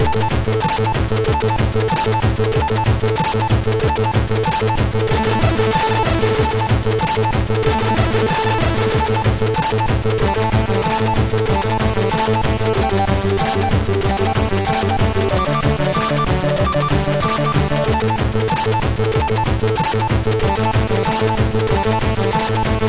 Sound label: sid MOS6581; Look Remix